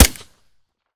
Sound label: fire sup, glock; Urban-Coalition; Decay; arccw ud